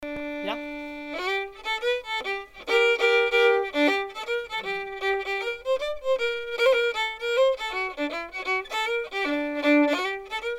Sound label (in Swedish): moll